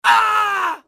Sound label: augh; Soldier Of Fortune II Double Helix; XBOX; faber